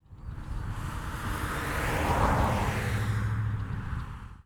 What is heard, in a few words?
Car passing by